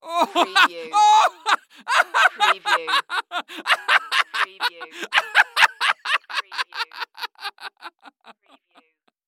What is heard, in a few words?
Laugh Hysterical Male; Sound Effects Bible